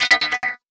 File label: Fill, FX; Sonic Foundry (Sony Creative Software); Esoterik Beatz